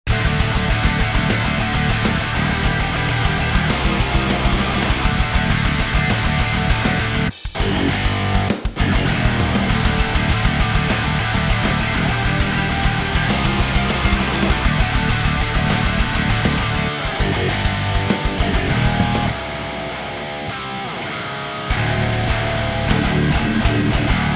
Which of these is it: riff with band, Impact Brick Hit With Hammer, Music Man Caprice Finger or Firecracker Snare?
riff with band